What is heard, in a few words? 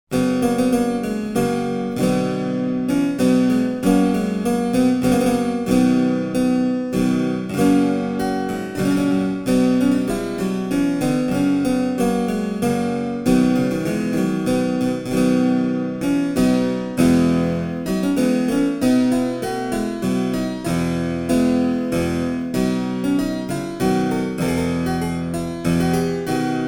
Italian Harpsichord; My Ladye Nevels Grownde; William Byrd